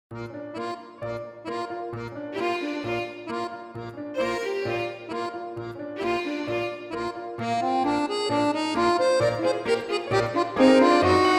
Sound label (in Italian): Trio violino; mini suite